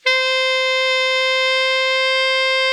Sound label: ALTO MF C 4, Sax; Greytsounds; Philip Wolfe David Crozier's Saxophones